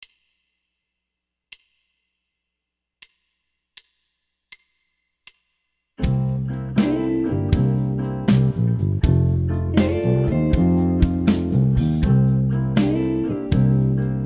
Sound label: Slow Blues 80